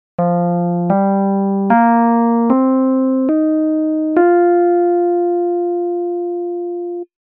F scale

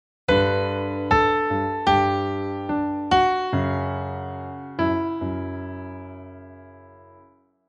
chorus b a g f sharp

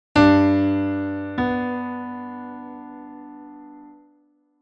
d and b over g

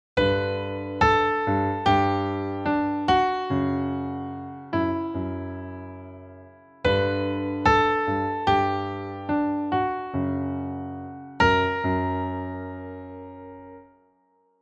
chorus a natural and a sharp

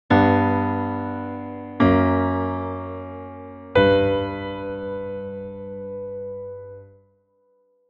octave displacement

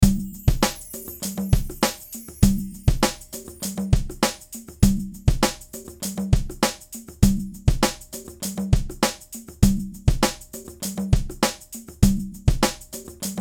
Conga Tambo Groove

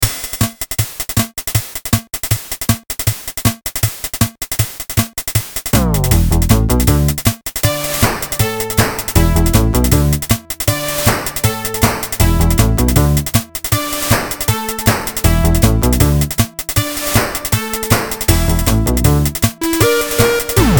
SEGA Master System cover

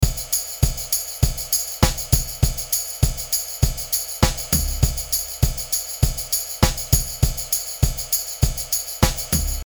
chorus

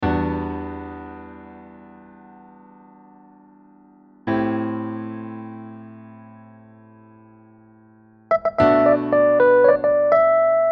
multi prog

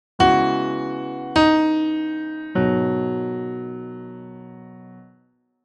three pitches

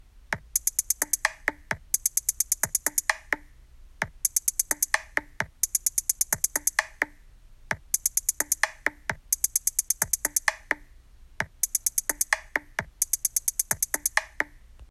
Beat

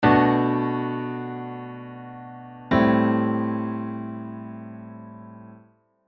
chorus

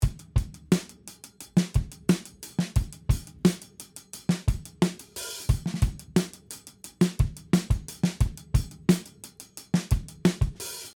88 BPM

